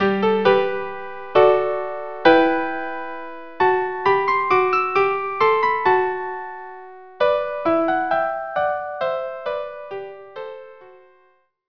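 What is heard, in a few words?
march violin violin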